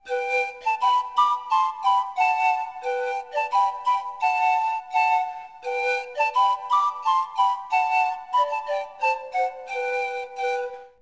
PANPIPES

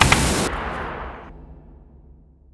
Gun; groundwar

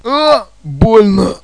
pain; DUKE RUS

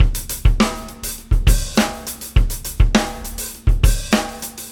ProgRock 99bpm, Drums; ACID MUSIC

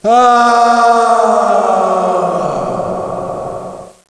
FALL; PC Gamer IT CD 38; Requiem

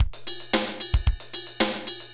machine

